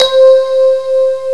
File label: diamond gem; jazz jackrabbit 2